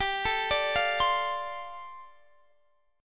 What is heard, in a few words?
G 7th Suspended 2nd Suspended 4th, Guitar Open D